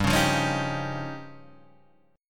F 7th Suspended 2nd Sharp 5th

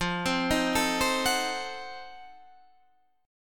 F 7th Flat 9th F down Guitar Standard; F7b9 arpeggiated

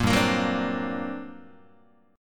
G Minor Major 11th G, Guitar; strummed; 1,3,1,4,4,1; 4A,4E,5A,5D,5G#,6C#; JGuitar

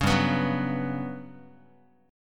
G Major 7th Flat 5th, Guitar; Listen to GM7b5 strummed; GM7b5 Chord

